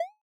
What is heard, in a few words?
tab switch, soft